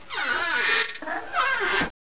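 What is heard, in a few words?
squeaky box; ambience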